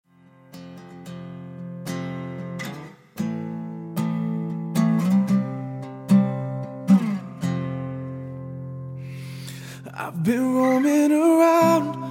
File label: Guitar Vocalist